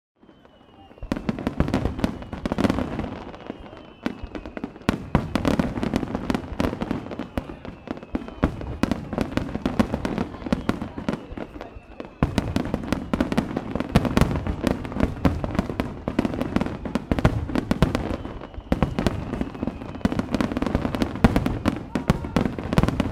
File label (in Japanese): natu